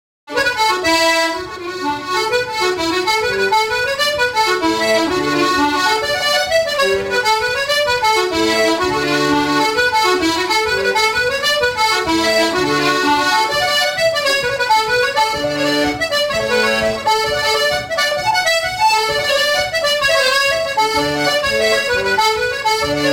jig, Sliabh Luachra jigs; the cordal jig bryan

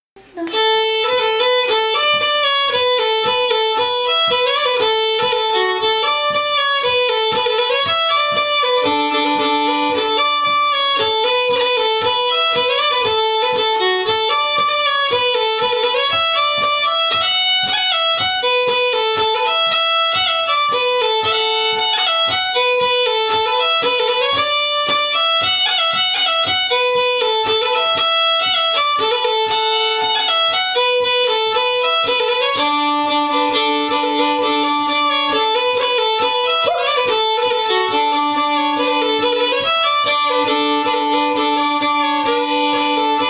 field recording; maurice okeeffes

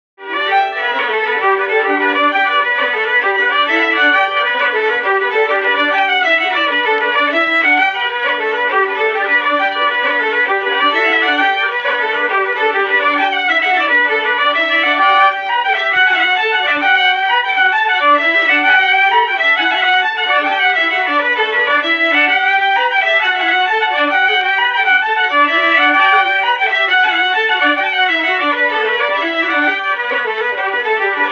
Kerry fiddles, Sliabh Luachra jigs; thrush in the straw

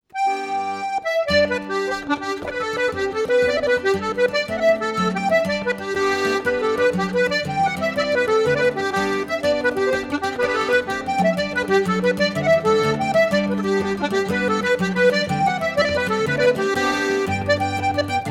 pitched down, Sliabh Luachra jigs; brigid mcrory donie

